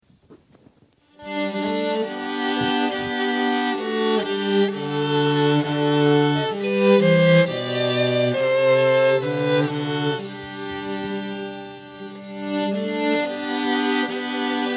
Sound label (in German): Violingruppe Lobpreis; Program in limba germana